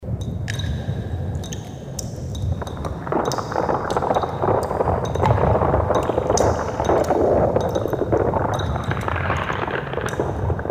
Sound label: Soundscapes Cave